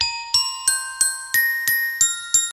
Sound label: Glockenspiel